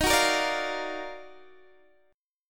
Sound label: D sharp Minor Major 9th, Guitar, DADGAD; x,18,16,15,17,15; chordshape